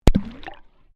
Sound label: Water Microphone Dunk Shallow Submerged; Submerged splash sounds; Splashes; Sound Effects:Objects